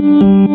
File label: Chords